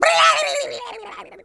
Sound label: crab scream